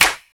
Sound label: original clap single shot g sharp key; single hits one shots